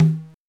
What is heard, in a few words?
Oberheim DX